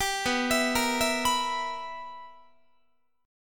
B Major 7th Suspended 4th Sharp 5th Guitar, chord; arpeggiated

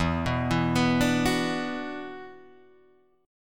E 7th Suspended 2nd, Guitar, Drop D

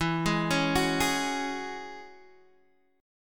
E 6th Add 9th E down Guitar Standard; E6add9 arpeggiated; JGuitar

